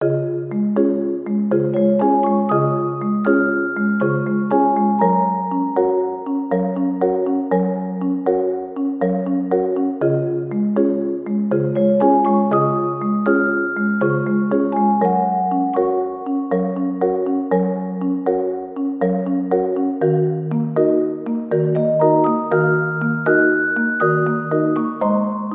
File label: Music; DragonDrop